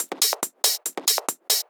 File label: Loops 140 BPM Hihat Percussion Loops